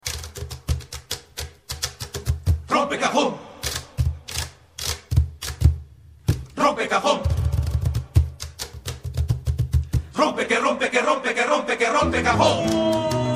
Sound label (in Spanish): Rompe cajon